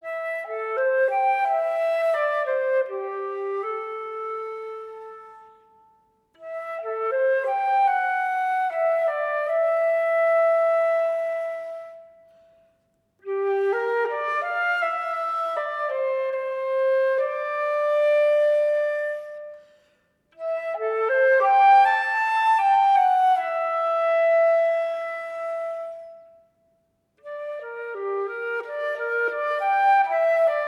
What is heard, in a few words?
solo flute